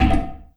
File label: Oneshot